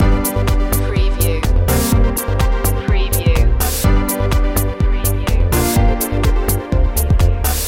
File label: groovy background loop